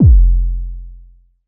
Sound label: bass 808 pure F; Polyend; ARC Noise